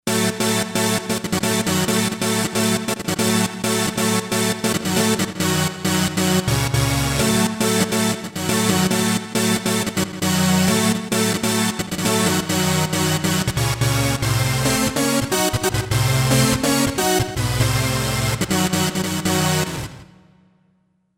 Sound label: Dance Saw; Roland SC-880; Factory Patches